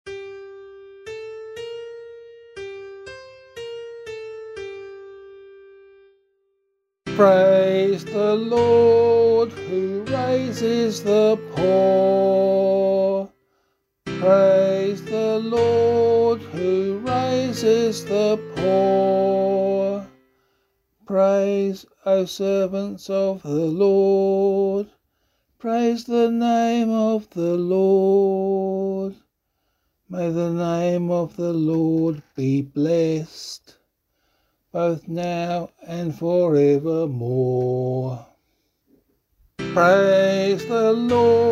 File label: Psalm C vocal